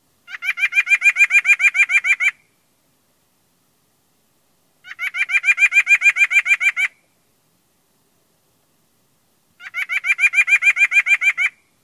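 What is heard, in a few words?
song, White-breasted Nuthatch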